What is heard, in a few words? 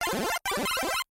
8bit video game startup short